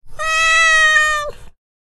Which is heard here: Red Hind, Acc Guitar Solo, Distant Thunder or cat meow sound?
cat meow sound